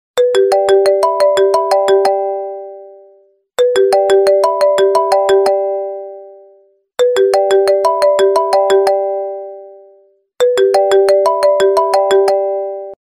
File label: RingTone